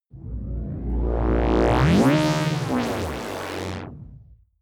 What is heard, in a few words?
fluctuating synth; comedy-cartoon; elektronisch